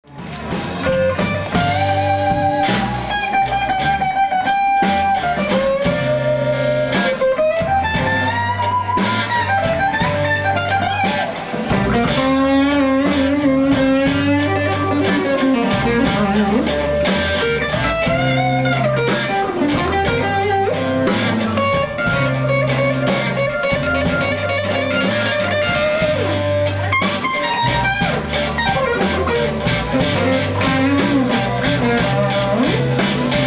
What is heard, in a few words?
slow blues